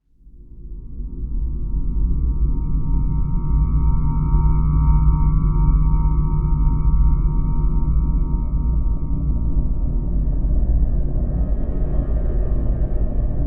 Horror Ambient Background

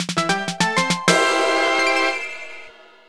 Jingle Win; BGM; Build-A-Bear Workshop Welcome to Hugsville; nds

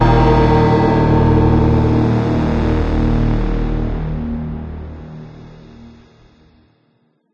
music storm stinger

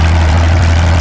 Vehicle Aircraft Loop

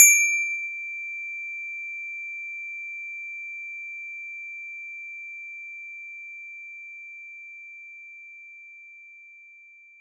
DX7 Strike; knights try

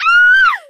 Scared Female; Friday the 13th: Killer Puzzle

